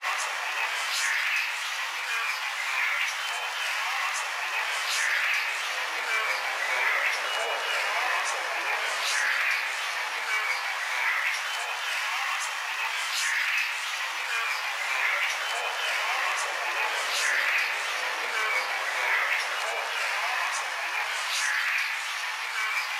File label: Crowd Interference, SYNTHESIZED MOODS; SoundFxwizard